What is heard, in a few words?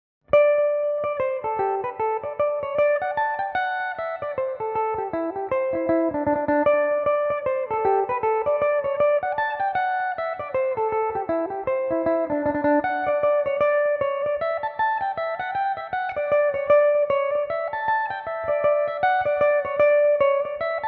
michael relihans dmaj, irish mandolin